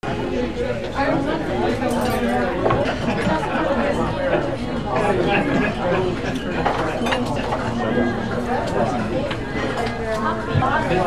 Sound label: AMBIENCE